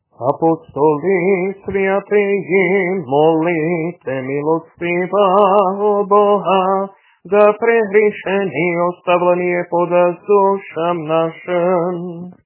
Tropár apoštolov, hlas 3; nedelna-skola

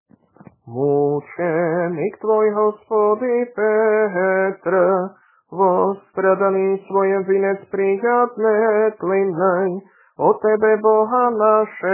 Tropár mučeníkovi, hlas 4; nedelna-skola

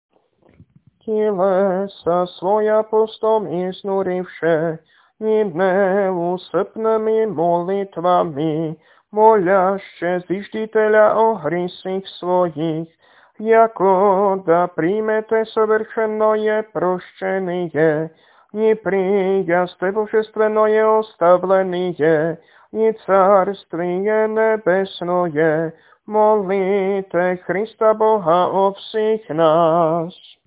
Kondák prepodobným ženám, hlas 2; nedelna-skola

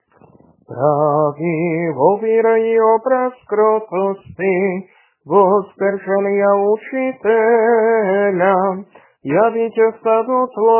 Tropár hlas; Tropár svätiteľovi, hlas 4; nedelna-skola

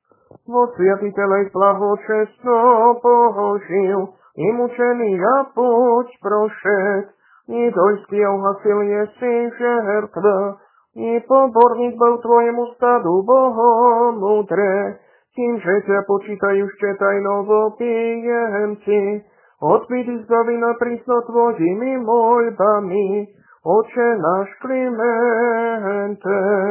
Kondák hlas; Kondák svjaščennomučeníkovi, hlas 4; nedelna-skola

